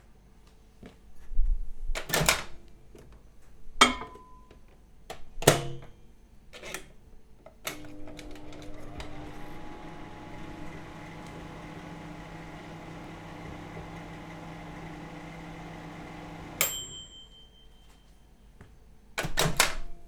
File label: Kitchen microwave; Food and Health